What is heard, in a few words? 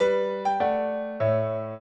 piano; Mozart